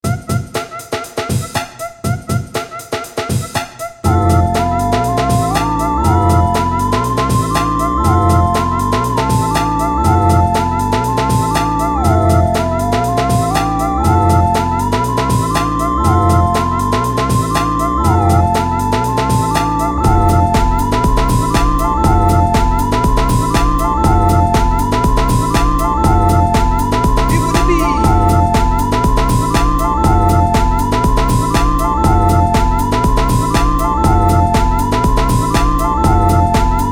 Techno Loop B

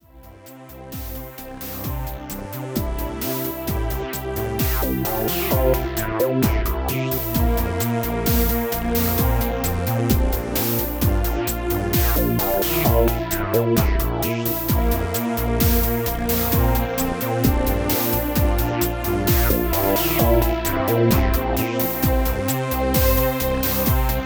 Sofa Christmas Jingle

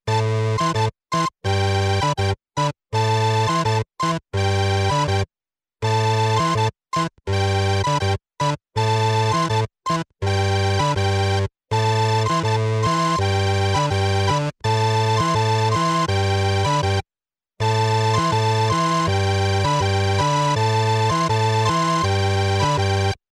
Game Faster Tango Theme

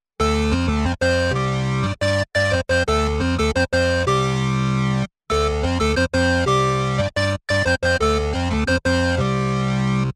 Game Faster Hippie Beatnix Theme

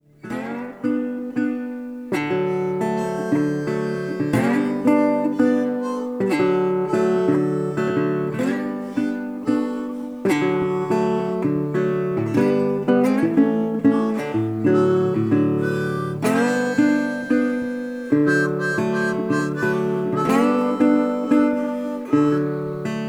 Harph

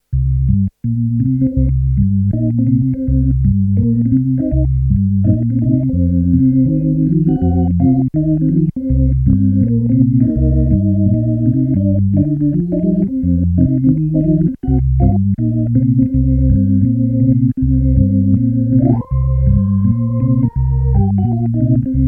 Jazz Organ

